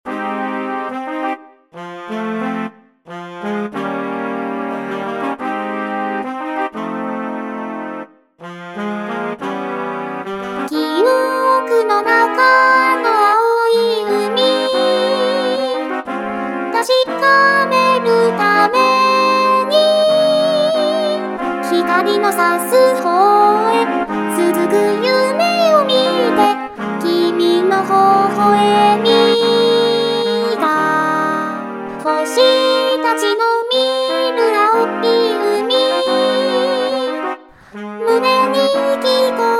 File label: SESSION HORNS